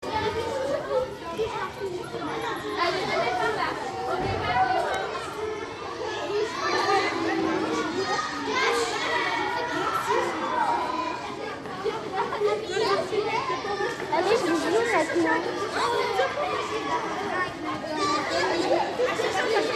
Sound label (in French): la recre